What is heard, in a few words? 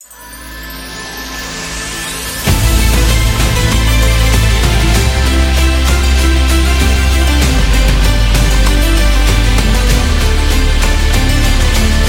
Islam